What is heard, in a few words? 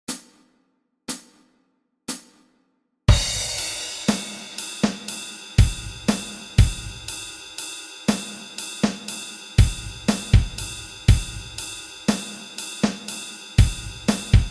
drum grooves tempo 60